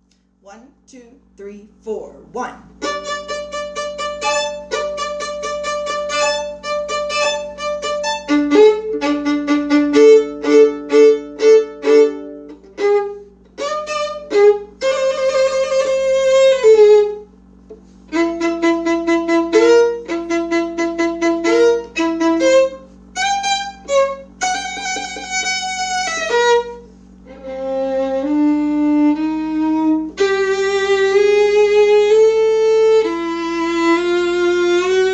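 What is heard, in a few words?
violin 2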